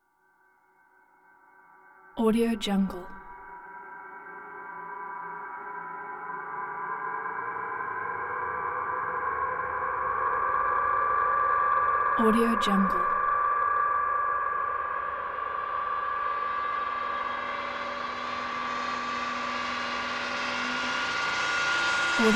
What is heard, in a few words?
transporter beam